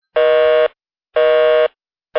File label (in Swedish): Ringsignal